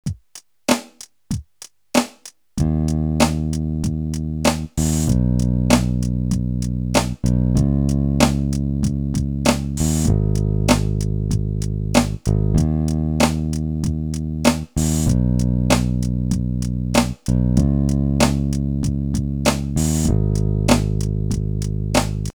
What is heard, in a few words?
backing